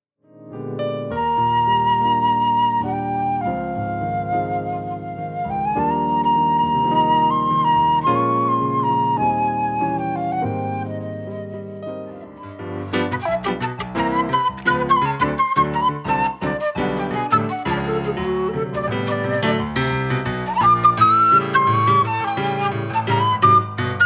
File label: flute duo